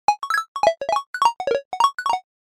chat, ringtones; KU5500

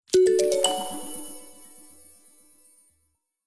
Appointment, Alert tones; Nokia; 515

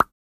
Water Drop Notification Midday; Xiaomi Redmi-Note-8-Pro

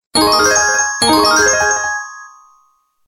Charming Intro tone; Samsung; SGH-F258

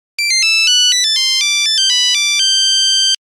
Nokia Buzzer Nokia tune; Windows Phone apps

